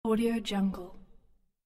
audiojungle watermark sound

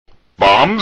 bombs

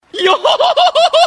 laughing

